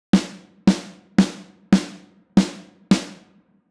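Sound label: 14x6.5 tama bell brass, snare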